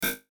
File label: Error Message; Electrical Button; Multimedia; Electrical Buttons; Blastwave